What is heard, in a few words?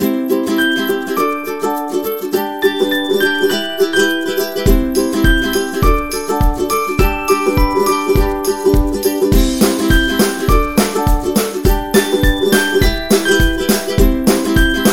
Upbeat Ukulele, happy music; happy music vol 2